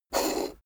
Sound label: poker chip flick slide table long